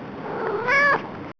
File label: Splat